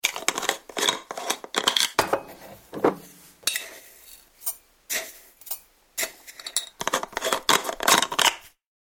Unscrewing coffee jar and spooning granules into a cup.; Instant Coffee Jar; Kitchen (Sound Effects:Household)